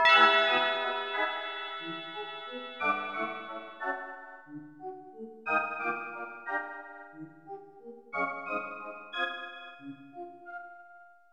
Secret Unlocked; Super Smash Bros

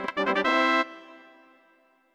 Success!; Banjo-Tooie

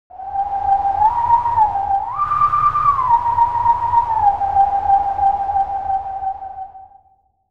Howling Stone - (Howling Stone); Legend of Zelda, The : Twilight Princess

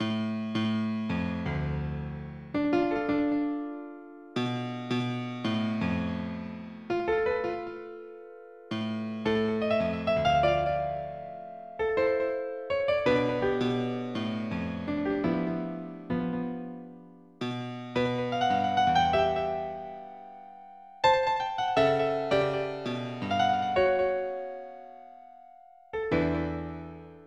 Piano Solo; Zelda - Majora's Mask

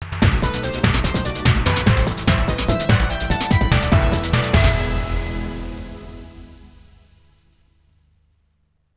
Fanfare; PlayStation 2; Metal Gear Solid 2

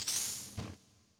Pick Up Fire Eggs; Banjo-Tooie

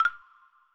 Pick Up Puzzle Piece; Banjo-Tooie

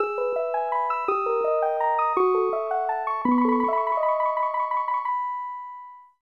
Princess' Message; Super Mario All-Stars

